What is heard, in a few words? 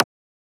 Transient; FLPRO